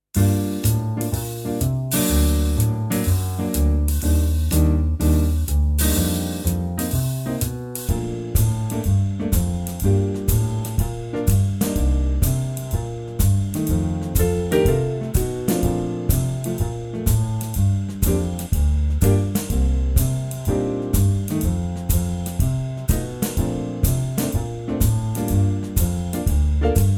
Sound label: More Bb No Brass